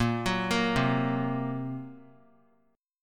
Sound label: Bb Suspended 2nd Sharp 5th; Bbsus2#5; arpeggiated; JGuitar